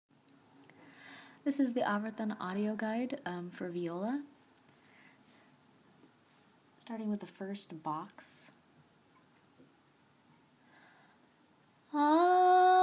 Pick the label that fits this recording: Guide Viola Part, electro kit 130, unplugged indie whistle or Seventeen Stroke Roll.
Guide Viola Part